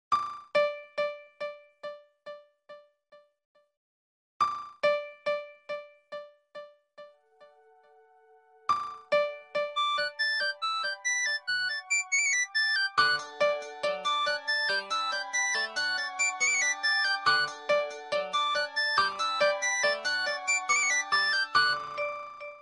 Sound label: nokia rococo